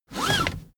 car seatbelt pull fast

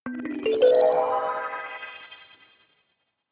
notification kalimba magical sequence ascend

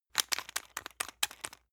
toothpaste open squeak; haus-garten; badezimmer

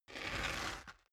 step boots concrete grit scuff; schritte; Footsteps

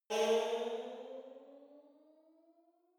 sci-fi vehicle turbo activate distant; raumschiff

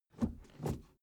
suv idle 1st gear change soft slow; verkehr transport; autos

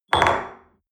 espresso upset table medium short

